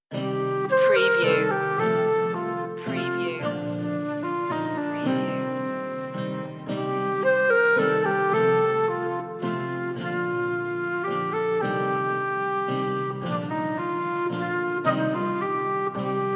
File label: medieval love story